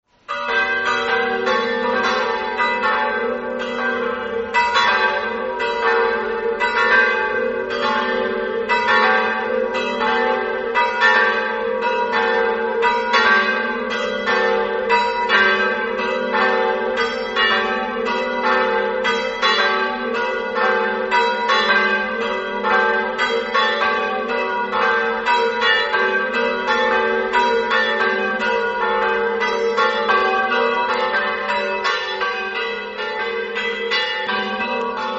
fiume, campane

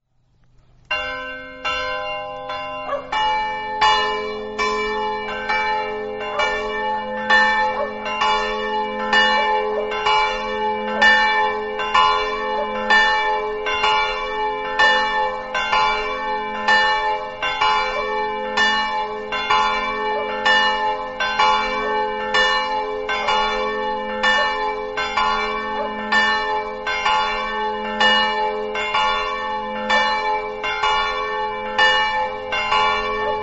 leproso, campane

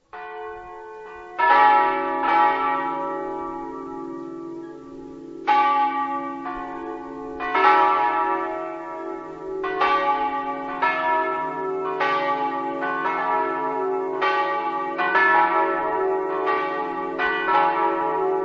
chiopris, campane

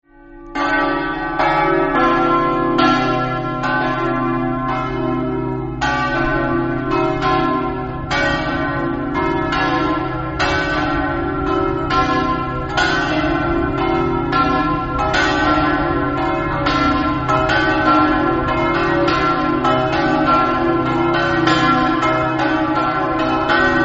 terenzano, campane

